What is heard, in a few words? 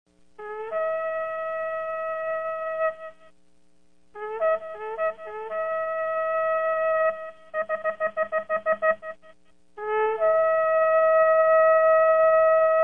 sounds of shofar